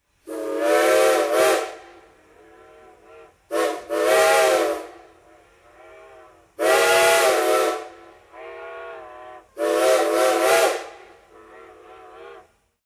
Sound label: steam train whistle